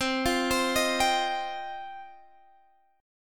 G 7th Suspended 4th Sharp 5th

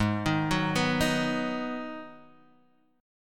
Ab Minor 7th, down, Guitar, Half Step Down

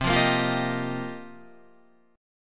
F 9th Suspended 4th C, chord

